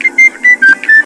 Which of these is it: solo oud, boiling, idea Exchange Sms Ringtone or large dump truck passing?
idea Exchange Sms Ringtone